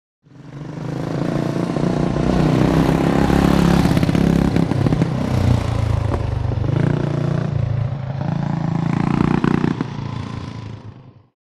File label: By Norton Motorbike D, Motorcycle, Norton, Accelerates In Through Gears